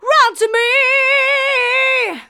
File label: Female vocals and robo-vox